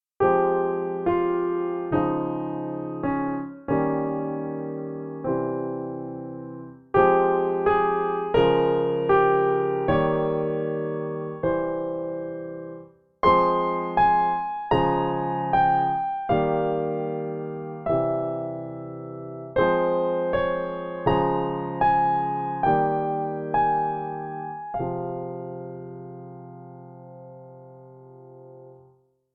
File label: melancholy